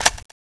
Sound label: system change; cstrike; next21 kart